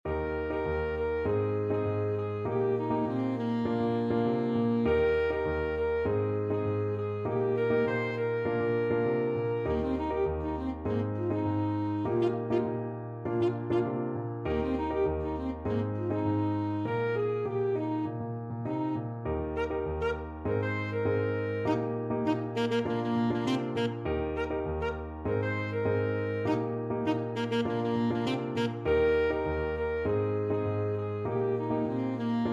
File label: saxophone